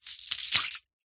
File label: nature ice slushy melted pick up drop splash